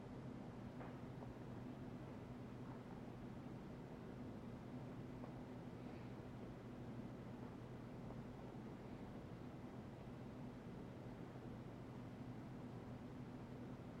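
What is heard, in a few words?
room sounds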